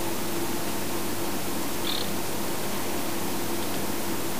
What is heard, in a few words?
Baillons Crake